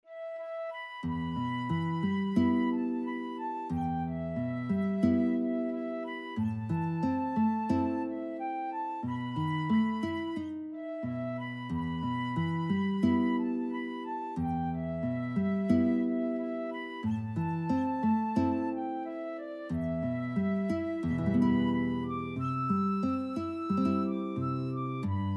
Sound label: guitar flute duet